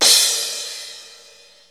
DPM3 KIT, Peavey DPM

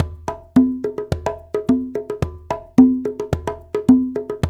108 AFRO, African Contemporary, Afro Triangle; Spectrasonics; Supreme Beats

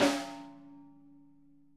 snare edge, Interactive Drum Kits; Sonic Reality

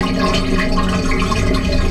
WET VENT PI, Sanitation Pipes; SFX

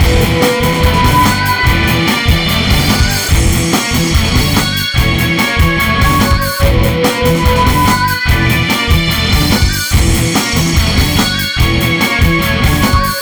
Hardcore Breakbeat, 145 Emin; Big Fish Audio; Hardcore Breakbeat Action; Breakneck

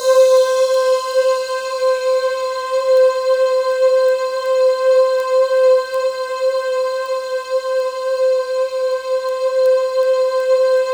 C4, Synth Leads; 90 s Sample CDs; NiceBeats (NiceBytes); Studioline 09

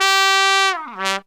TRUMPET FX, Phantom Horns; Zero-G

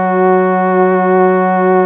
PAD ZEN Pad; Best Service Gigapack 1

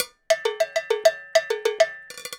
Cow Bell, Latin Percussion

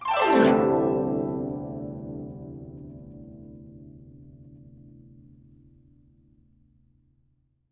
GUCH GLS, GUCHIN; INIS - Opium; GUCHIN-GLS

